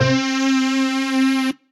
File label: Analog Qube, Retro Synths; Ableton; P-50 Linear; P-50 Structures